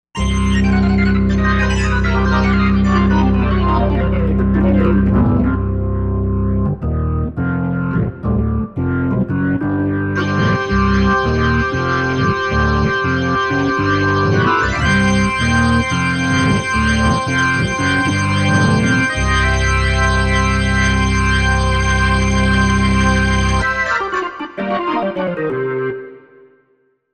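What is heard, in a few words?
Organ Hard Rock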